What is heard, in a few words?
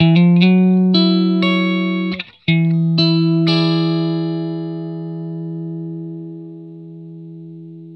TURN 1 B, Vintage Blues Guitar, 120; USB Soundscan vol.22; AKAI